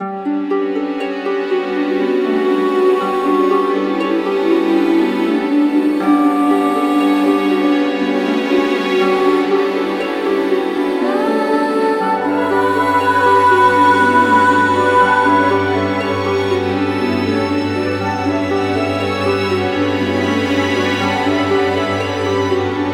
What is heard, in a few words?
Bgm dungeon